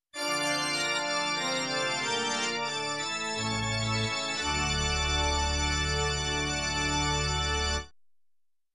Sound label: Major Organ Ending